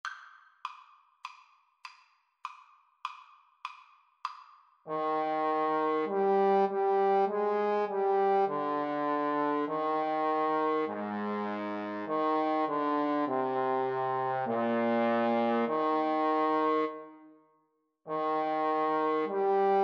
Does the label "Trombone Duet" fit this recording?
Yes